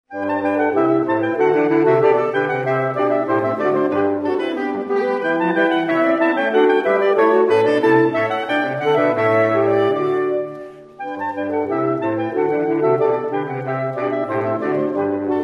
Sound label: sax classics jazz